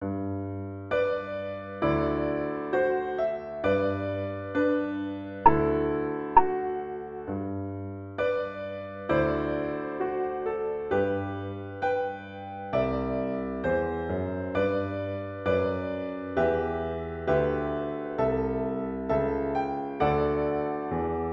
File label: alto